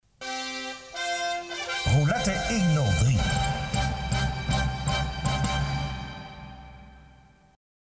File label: Jingle